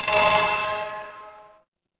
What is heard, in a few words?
door doorbell